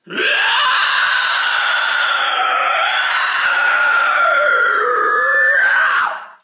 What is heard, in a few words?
vampire scream; medium